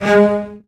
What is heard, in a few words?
CELLOS A#3, Strings; ILIO; Synclavier Strings